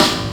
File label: SD, dance drums hip